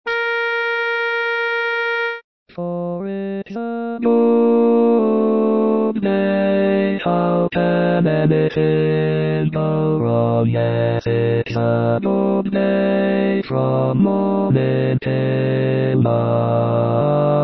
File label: bass, barbershop; teach tracks